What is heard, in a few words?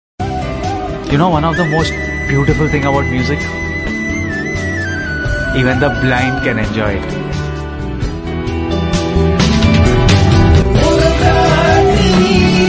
tamil love failure heart broken